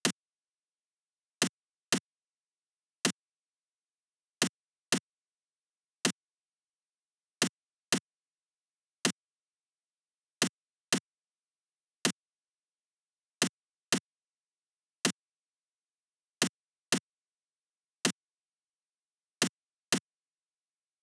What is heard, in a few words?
Dry 80bpm Percussion